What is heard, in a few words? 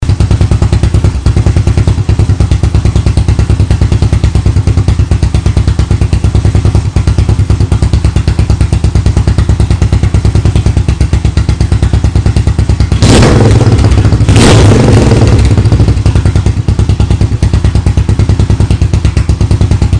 Screamin Eagle; Savage